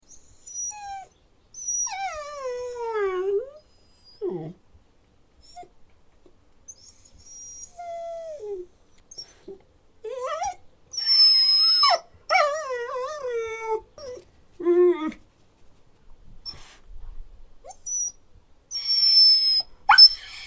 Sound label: dog whining sound